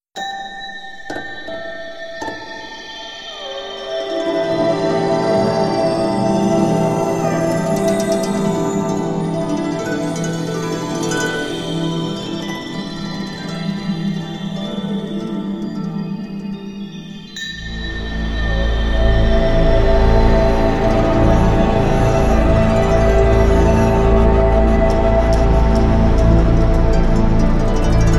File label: Harfa Solo; Hotel Bonifacio Koncert Księżycowy; Repertuar od standardów jazzowych do własnych kompozycji.